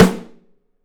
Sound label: PICOLO; 3D Drums